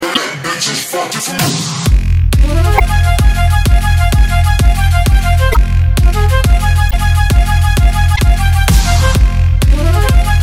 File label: flute